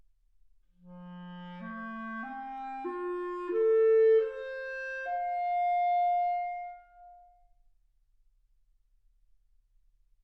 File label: clarinet